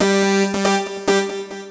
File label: break; Techno Music Maker; Harmony